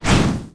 Swing Miss Gold; gamerip

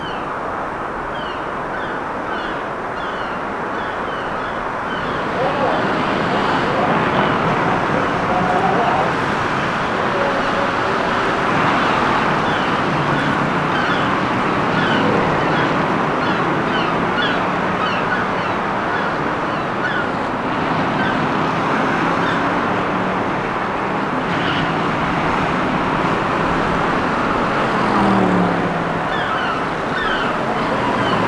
Ambient

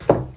Run

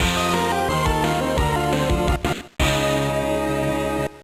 Unknown Fanfare; Hot Wheels Burnin' Rubber; GBA

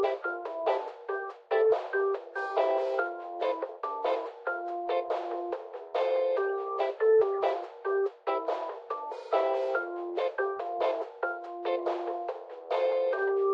Elevator Jingle; Lethal Company

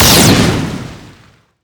Crusher Shoot

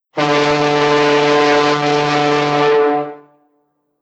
Golden gate bridge Ship horn

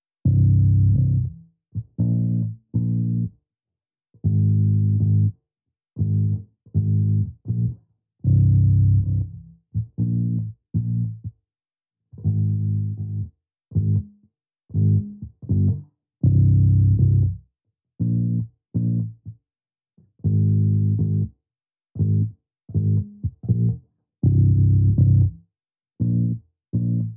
Basses 60

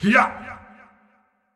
Effort Short; Marv

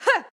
Swipe Normal; Jackie

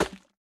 Slime Impact Large

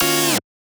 Fill Tech Chord 160 D#

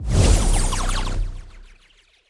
Surfer Unlock Arrows; subway surfers city sound effects; Android, iOS

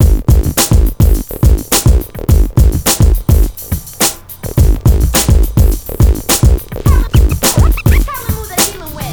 Ditty; NBA Inside Drive 2003; Xbox

